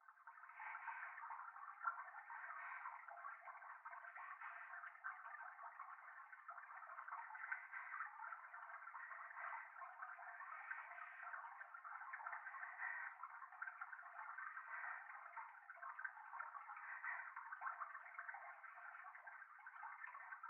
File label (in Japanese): トイレ 左耳 密着 吐息 川のせせらぎ